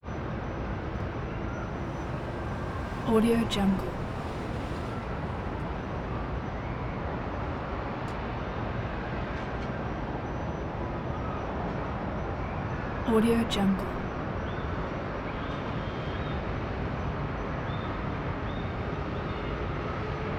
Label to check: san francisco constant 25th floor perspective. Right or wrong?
Right